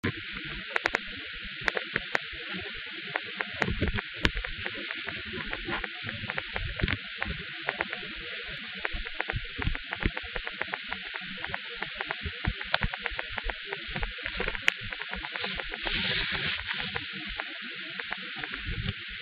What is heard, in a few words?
sugar glider happy sounds; Popping